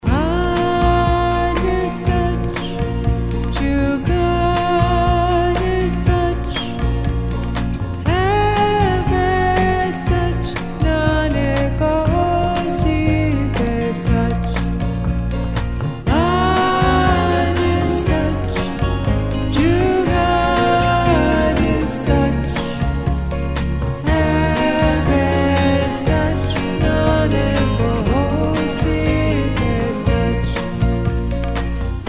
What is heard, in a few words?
Sikh Ringtone; Aad Such; Navratri Special